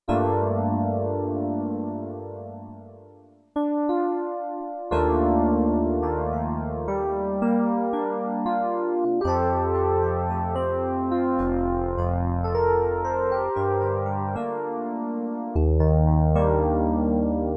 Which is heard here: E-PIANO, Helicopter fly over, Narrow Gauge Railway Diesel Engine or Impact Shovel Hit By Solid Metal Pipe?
E-PIANO